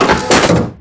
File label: reload smoke, scar tank